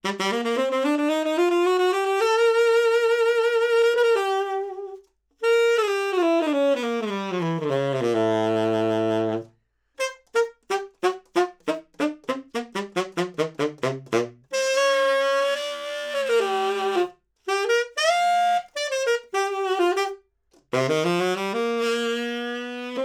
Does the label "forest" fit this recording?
No